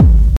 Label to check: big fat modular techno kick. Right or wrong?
Right